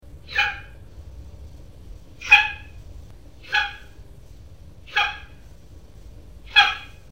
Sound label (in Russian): zvuk izdavaemyi gepardom